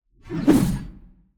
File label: morgenstern swing; player